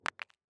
ICE Hockey puck hit rink

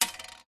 industrial washer metal set down on metal surface

